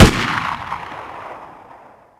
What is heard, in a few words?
thompson shoot, weapons; svencoop; ww2projekt